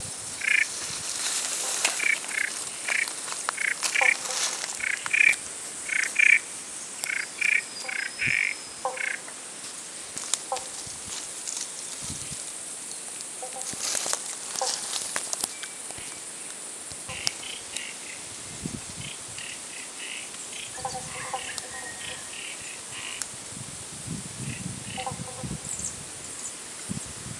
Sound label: Frogs and Birdies